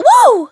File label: damaged; lele